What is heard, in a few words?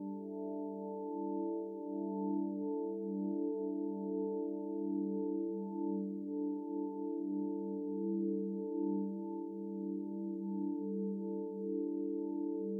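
harmonics slowed down compound, Slowed-Down Harmonics, electric bass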